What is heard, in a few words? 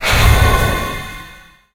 UI player create